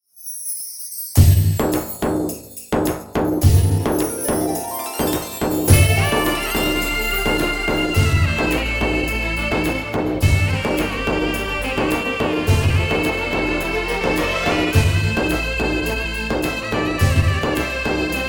Climax BGM; Shankar Dada M.B.B.S

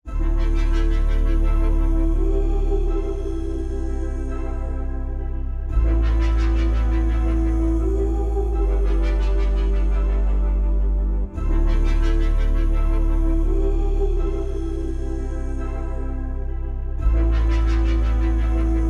Emotional BGM; With Love

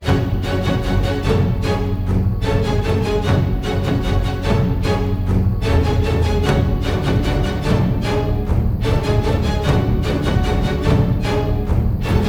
Emotional Theme, Malayalam

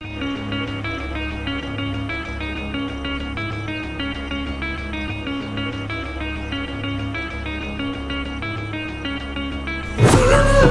Unreleased BGM Mix; Vettaiyan Hunter Vantaar Unreleased Theme; without dialogues

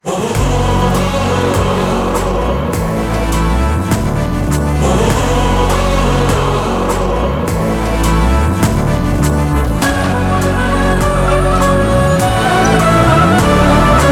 Trailer BGM; Ustaad Bhagat Singh

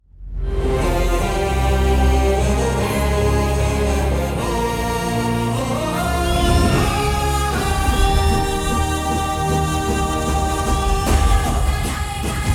Entry BGM; HHVM

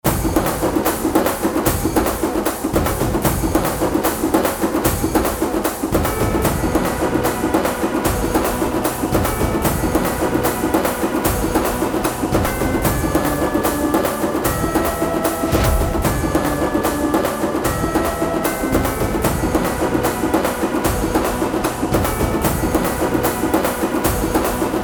Fight BGM Mix; Veera Simha Reddy Istanbul Fight Mass BGM Mix

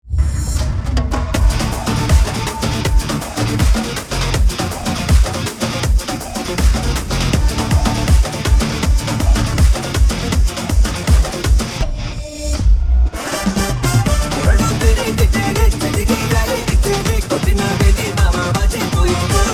Climax Fight BGM; Robinhood

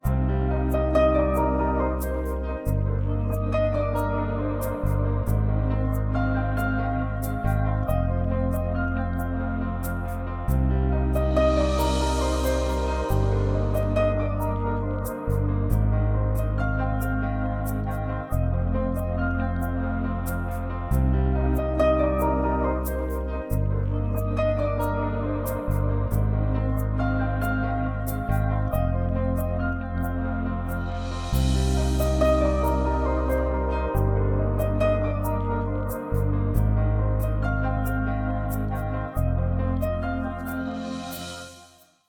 Piano Love Theme Mix; Thandel

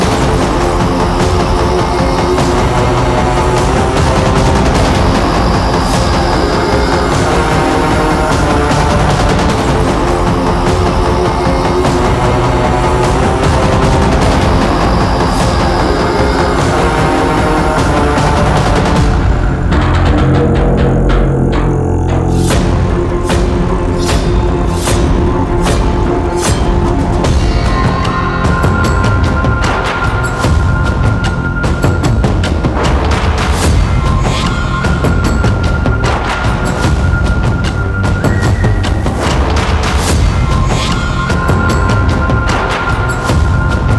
BGM Extended Mix; Black Sword; Mirai

